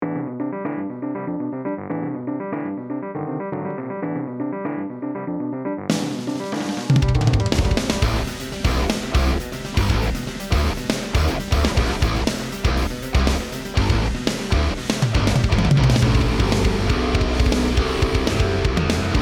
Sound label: backing track; G.O.D. COMPETITION素材